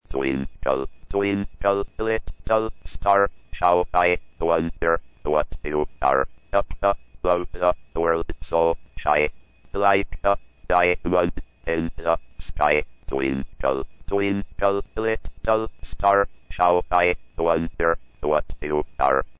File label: twinkle